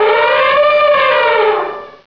elephant

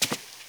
ladder step; wob2 demo

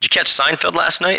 gag; SpecOps2 MPlayer Demo

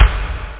gate kick; OctaMED SoundStudio V1; jazzies samples; perc

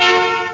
car horn

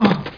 ranger falls; SpecOps2 MPlayer Demo

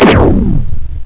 nuke

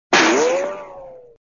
Feather Go; moonstone madness

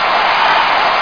ski; NewSurfs

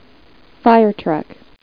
FIRE; KidPak

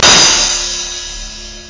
cymbal clash

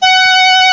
violin; The Epic Collection 3; Epic Marketing; muzak samples

